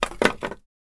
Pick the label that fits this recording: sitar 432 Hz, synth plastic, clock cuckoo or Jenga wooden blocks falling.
Jenga wooden blocks falling